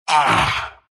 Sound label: pain ru; dota2